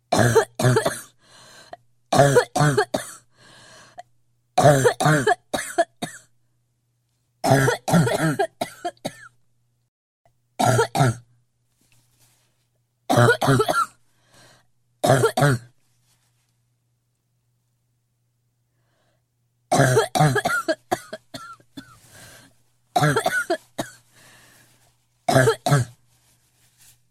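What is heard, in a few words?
Woman coughing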